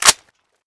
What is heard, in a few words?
lr300 bolt forward